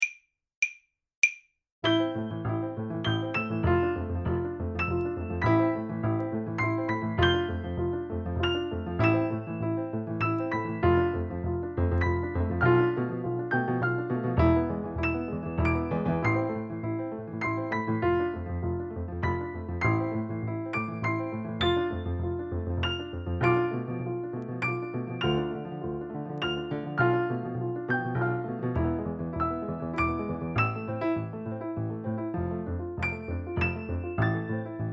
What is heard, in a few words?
piano